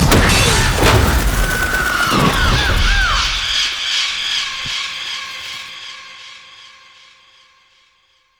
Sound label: crowstorm; LoL; fiddlesticks